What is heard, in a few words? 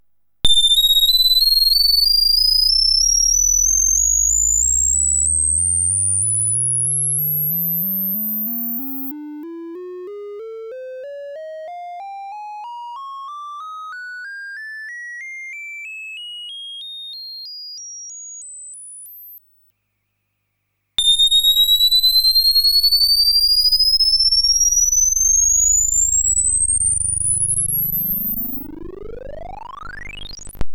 pokeymax triangle